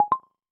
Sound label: Cable Connect